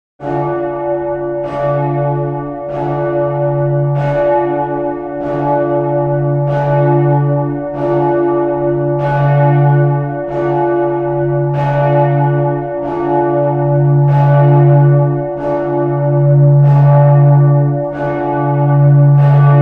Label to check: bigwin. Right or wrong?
Wrong